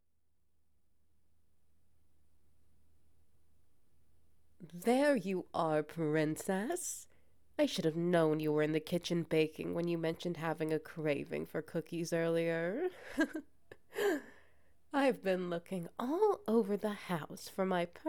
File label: Wet Noises; Wife of the Succubus